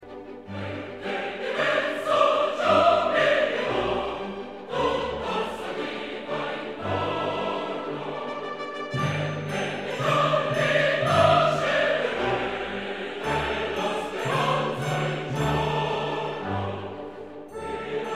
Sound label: Lucia Choir